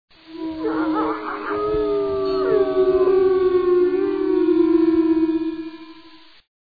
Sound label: wolves